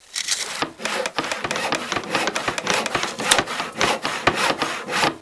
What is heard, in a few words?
building sound